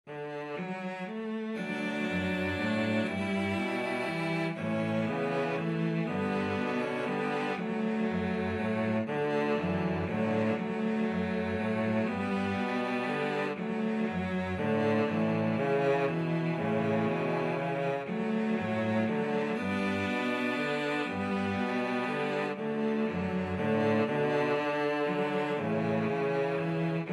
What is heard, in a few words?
morning has broken, cello trio